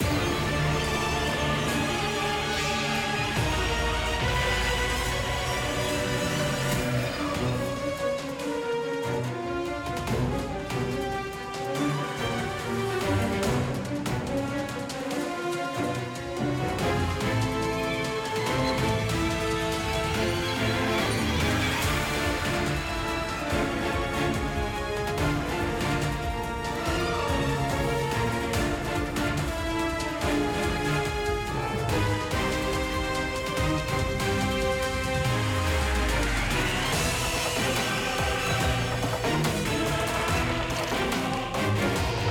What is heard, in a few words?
Battle Boss; Metus Phase 01